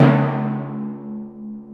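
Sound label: Timpani Hit Low; EMU PX-7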